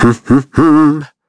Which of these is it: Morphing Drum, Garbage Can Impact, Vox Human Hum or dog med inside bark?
Vox Human Hum